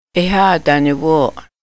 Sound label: crowd